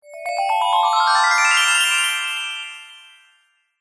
fantasy magic glissando rise bell tree style fairy